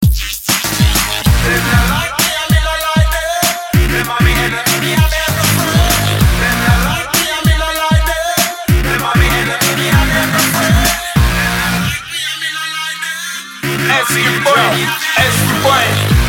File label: rap dnb